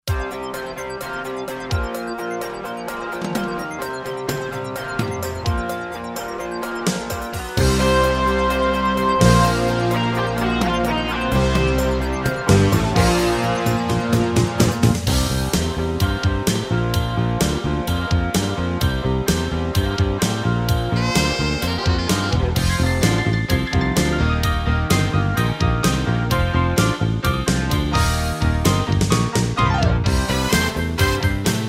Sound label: rhythm, kn7000; technics